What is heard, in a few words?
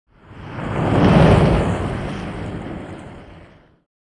By Bentley Whoosh Pas, Automobile; By; Bentley Whoosh Past.